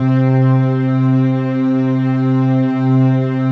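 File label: PAD, atmospheric pads; Best Service; XX Large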